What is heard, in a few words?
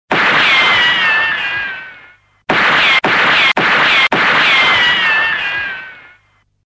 Gun Western